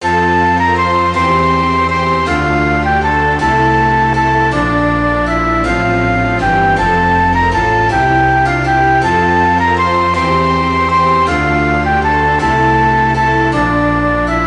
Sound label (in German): pastorale orchesterfassung